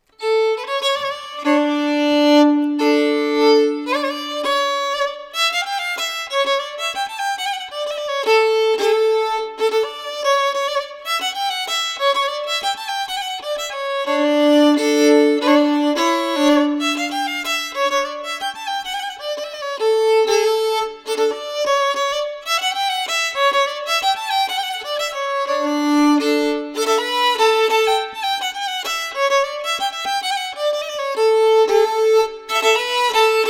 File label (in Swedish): polska efter norm; Per Lindstrom